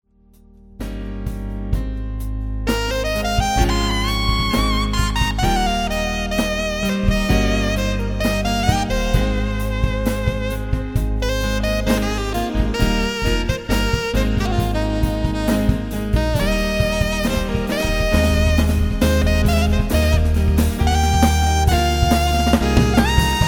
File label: Bridge Over Sax Solo